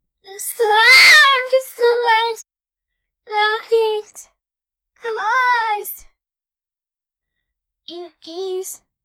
lady teacher farts in her, classroom

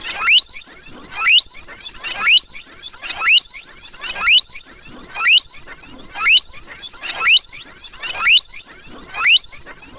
create nothing but high pi, abstract

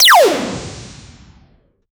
plasma gun, blast

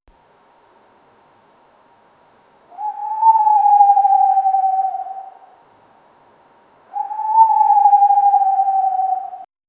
a deep realistic sound of, wings

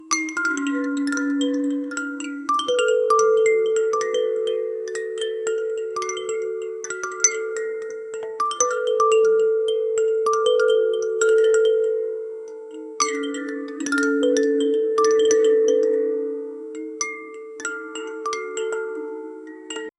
calm loopable ambient for, ethnic

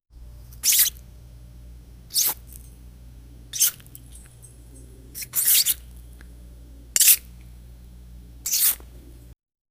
a clean soft shhhk; fruit

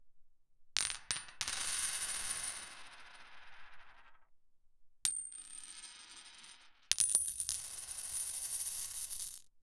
coins falling onto the fl, money

